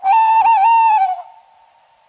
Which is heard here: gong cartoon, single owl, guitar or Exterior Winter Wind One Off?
single owl